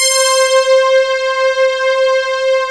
HARPSI STR; AKAI S-Series CD-ROM Sound Library VOL-8

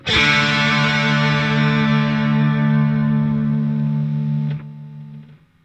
Funk Guitar; 90 s Sample CDs; Zero G

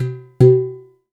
CHIN MUTE, KOREAN PERCUSSION; AKAI S6000 CD-ROM - Volume 5

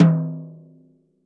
rack tom - snares off; JazzFunkKit

